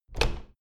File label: door plastic handle pull down hard